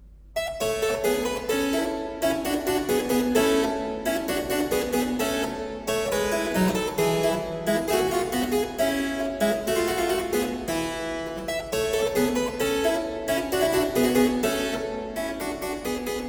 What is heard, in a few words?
Allegro in B flat major, Keyboard Works; Mozart